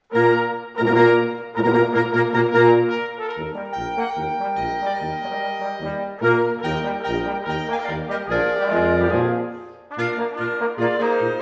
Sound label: German Band; Detroit